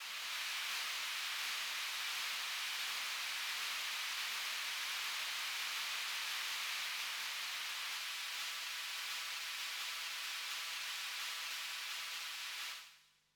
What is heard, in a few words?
white noise